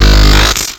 Laser Fire

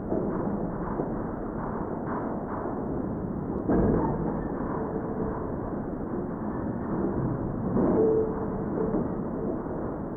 Ore Drop

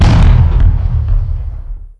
All Projectile

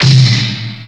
All Hits

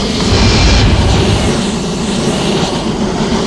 Flame Machine

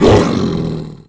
Auto Canon Fire

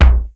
Collision

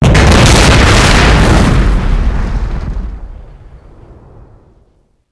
mech explodes; firestorm; Gameleap; mw4

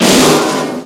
Mine Explode

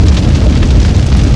Small Fire Loop

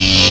Laser A Sustain

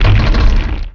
Collision

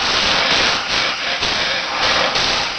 Coolant Leak

